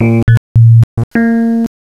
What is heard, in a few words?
ENSONIQ EPIANO STRINGS VIBES FLUTE